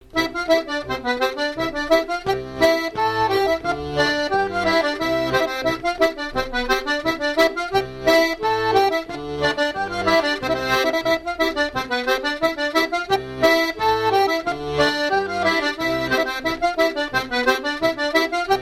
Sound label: Scottish, harmonica